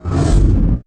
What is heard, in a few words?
IMP FLAME, Cinematic Impact; M-Audio Pro Sessions; Liquid Cinema